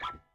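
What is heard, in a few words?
Yamaha noise, Bass; Masterbits CLIMAX 7; PATITUCCI BS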